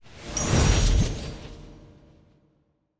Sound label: btn sound